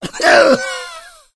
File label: smoker pain